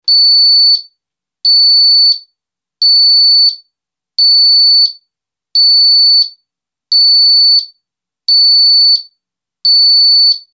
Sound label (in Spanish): tono; EVO MINI